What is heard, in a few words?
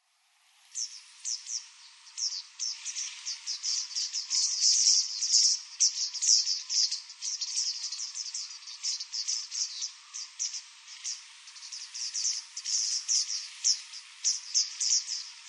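Japanese Wagtail; utsunomiya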